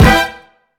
Stab Vinyl Punchy

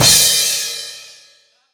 Crash Stacked, Cymbal; One Shots; Drums; Ableton Live 12 Lite; Core Library

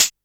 Hihat Closed

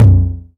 Tom 909; One Shots; Drums

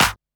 Snare, drums

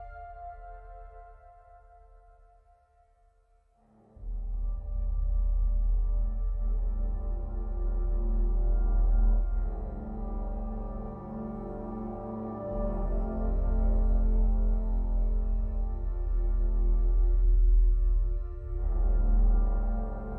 score Graveyard; Journey

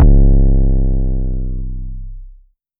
Kick Rumble

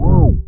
cancel; Game Maker 8

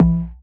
Tom Digital Layered; Drums

